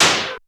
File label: BEAT, FX PERCUSSN